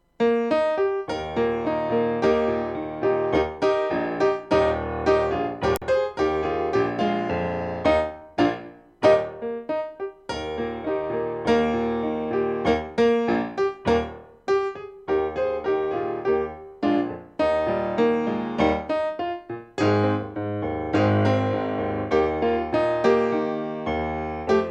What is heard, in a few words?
song old river piano